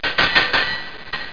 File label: CLANK